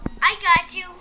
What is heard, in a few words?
argue; 3D Game Programming All in One